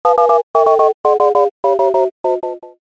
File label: sad Clown